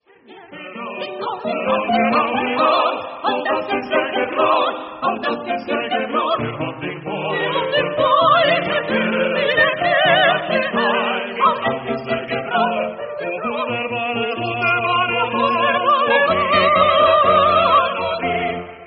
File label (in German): Mehrstimmige